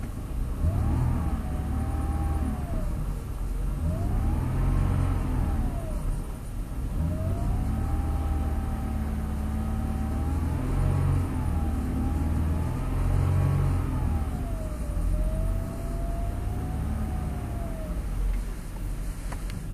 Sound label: SLK inside roof up, idle pulley noise; SLK R171; idle pulley noise demo